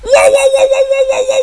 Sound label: woah